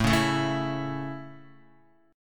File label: A Major, Guitar; strummed; JGuitar; x,0,1,2,1,0